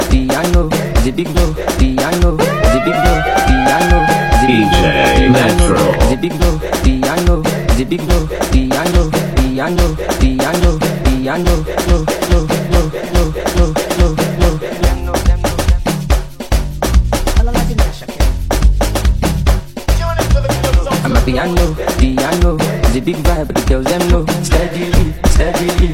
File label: Amapiano